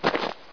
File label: player dirt; mistake coop